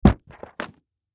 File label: Textbook Drop, Textbook Drop Carpet; Books; Offices; Blastwave; Foley